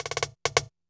drums, 132 Fmin, Hardcore Breakbeat; Big Fish Audio; Hardcore Breakbeat Action; Warned

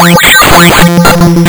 lsdj waves; wavetables

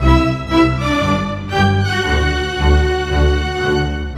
Strings; Sonic Foundry (Sony Creative Software); Orchestral Series VOL-4 - Rock and Pop

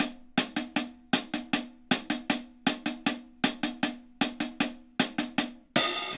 HAT, HI HAT LOOPS, Dub; Drumdrops In Dub VOL-1

